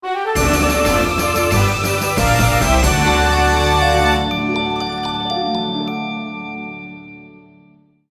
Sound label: Victory Jingle; Magical Drop VI; Windows